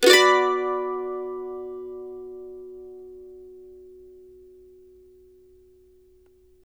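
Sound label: CEE CHORDS B minor, Blues Guitar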